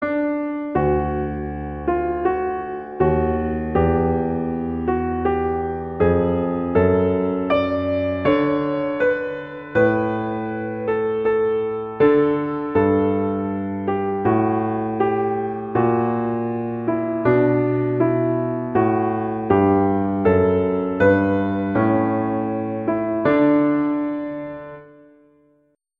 I Heard the Bells on Christmas Day, Christmas Beginners